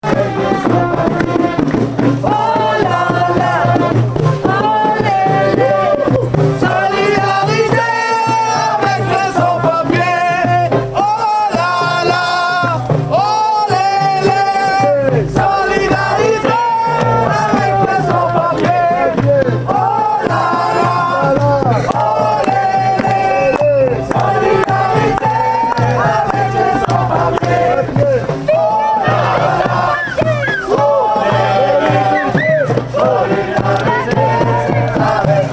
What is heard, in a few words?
march for freedom atmosphere; Marsch fuer die Freiheit Mai 2014